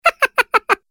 cartoon voice laugh; мультяшный смешной смех различных персонажей

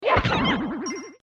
kill enemy; Звуки из игры Марио

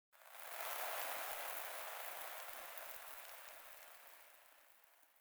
sand in the desert

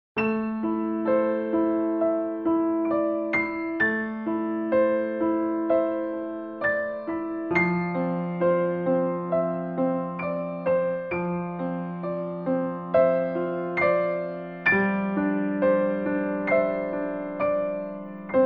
fortepiano

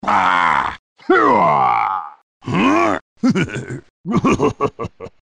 bah hrahh huh lagh; bowser

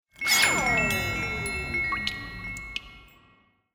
fairy water spell

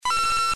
gets a coin; mario

